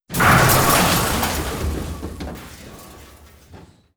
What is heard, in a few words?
grenade explo debris; weapons; Half Life Alyx